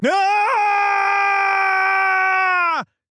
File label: Falling; Knight; Paladins